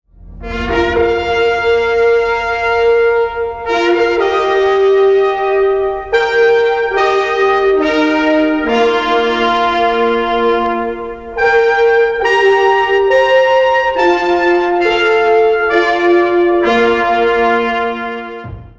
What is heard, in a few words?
three fanfares